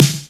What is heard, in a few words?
Snare; Vengeance Samples